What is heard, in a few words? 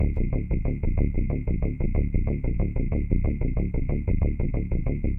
Moody Bass